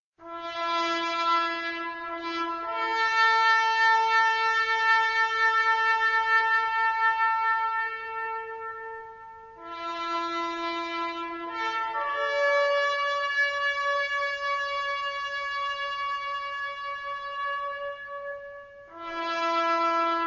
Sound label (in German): Zapfenstreich; filmova hudba; wilder westen heisser orient